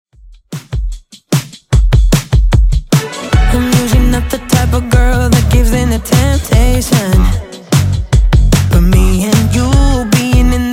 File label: Pop Dj Intro Outro 150 Bpm